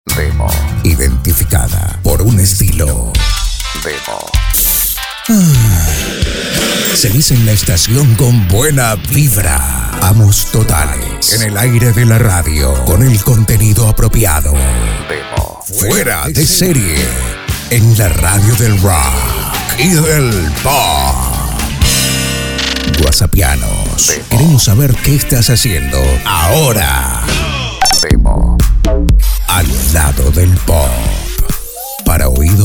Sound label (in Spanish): Arte Todo el Año Rock Pop